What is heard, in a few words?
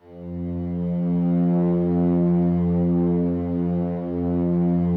slow strings F1, Yamaha VP-1; Music Store; 90's Sample CDs